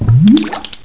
Gloubs

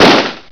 garand shoot fire

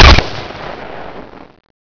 BAR shoot

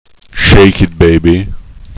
shake

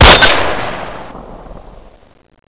Garand shoot

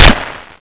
MP44 shoot

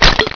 gren throw

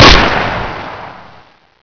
fg42 shoot

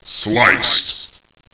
SLICED

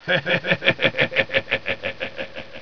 hehehehehe

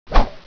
knife swing

